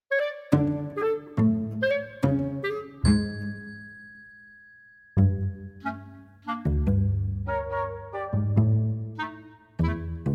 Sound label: Dog Whizz Comedic